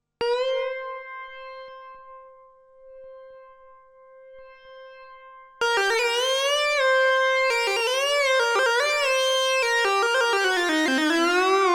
Stereo Shred

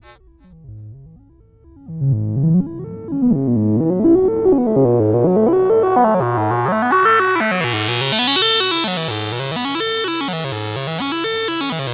LFO motion detune

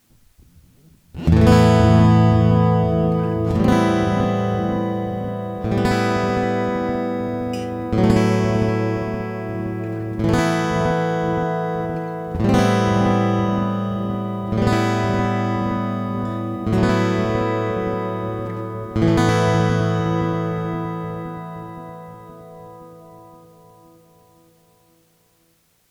Harley Benton TravelMate E Delay

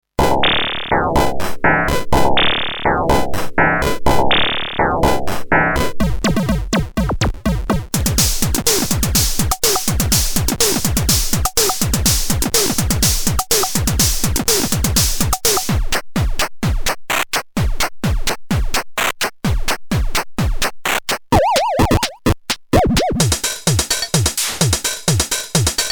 DCM8 Beats straight

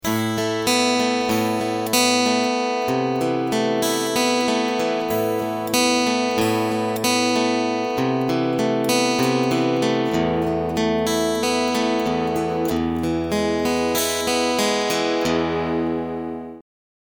high boost picking

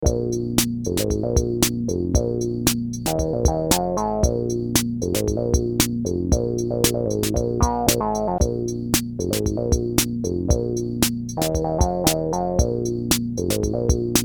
SH 1000 Bass CR 78

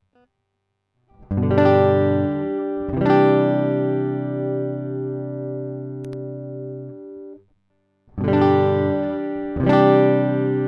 Warm Audio Tone; Pream Test

